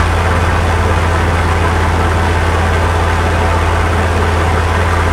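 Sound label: bomber cockpit right, engines; War Thunder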